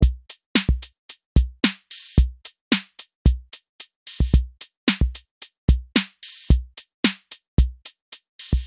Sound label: Drum